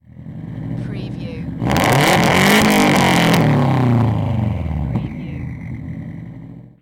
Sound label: SUBARU STI EXHAUST MULTIPLE REVS